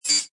Electrical Pulse Glitch Swell, Science Fiction Sound Effects